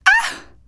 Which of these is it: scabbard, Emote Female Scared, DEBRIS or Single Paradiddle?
Emote Female Scared